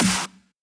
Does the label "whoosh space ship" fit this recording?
No